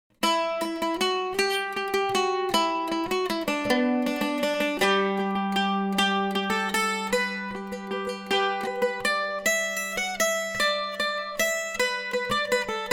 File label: waltswaltz, mandolin